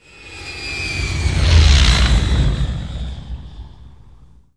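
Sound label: shp flyby, ship; millenium falcon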